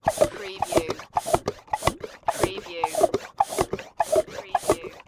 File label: Pesticide Pump Slow